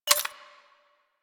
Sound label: Pistol Mech A; Bard